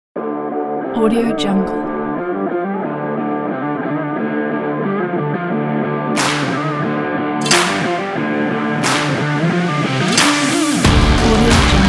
driving breakbeat pack

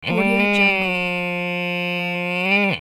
weird and humorous long animal call; sound ideas

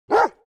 animal dog labrador bark dry; smartsoundfx

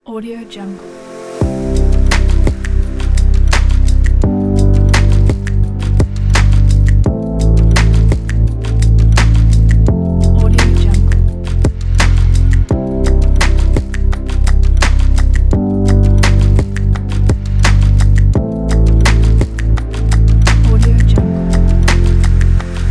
engaging chillout

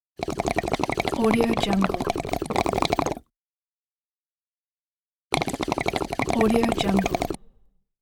hookah

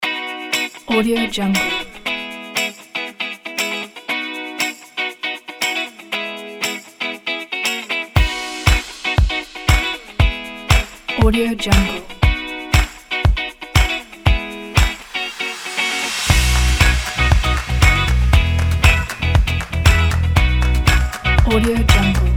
fun guitar bells

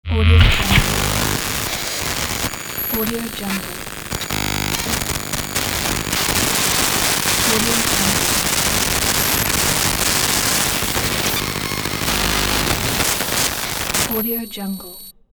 static glitch fx

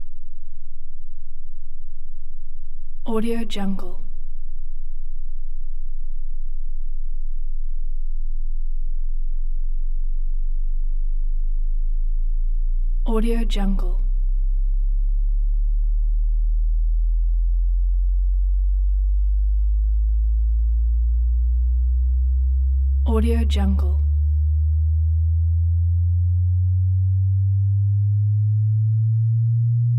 lfe test tone log sweep

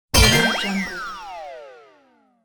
game dazzle fall down

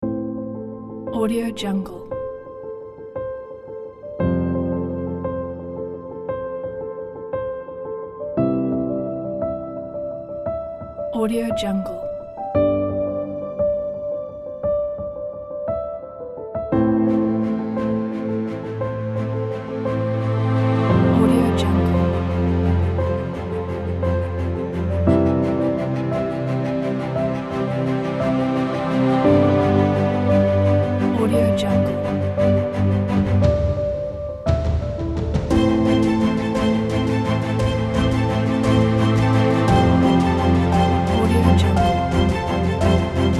epic romantic

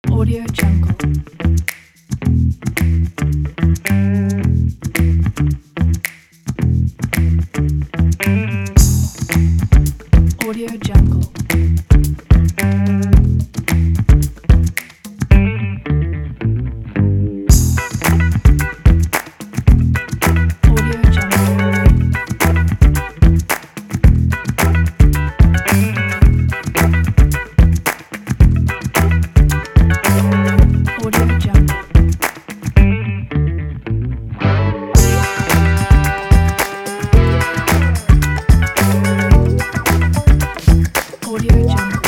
funk it

